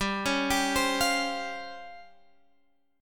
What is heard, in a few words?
C sharp Major 7th Flat 5th G